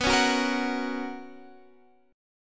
B Major 7th Suspended 2nd B down Guitar Half Step Down